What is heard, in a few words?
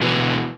prophet, Notes, Guitar